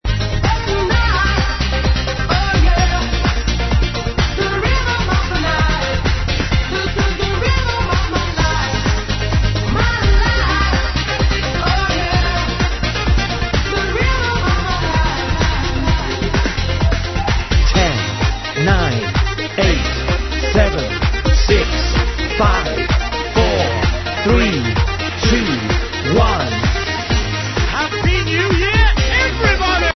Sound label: rhythm sleigh bells happy